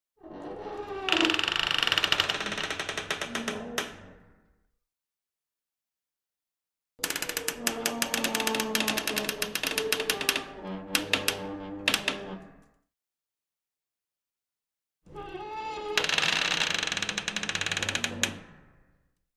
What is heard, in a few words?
Trap-Door, Heavy Creak; hinges & creaks